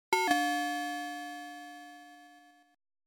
doorbell; Little Computer People; gamesoundopedia

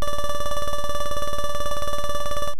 point countdown; kung fu master

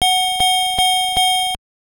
dong; punch-out

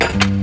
slide lock push in handle; We Were Here Forever

